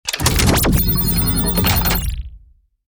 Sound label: Reload Large Laser Power Injection